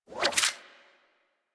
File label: Alchemist Serpent Ability Tendril Retract; Warframe; DE; Warframes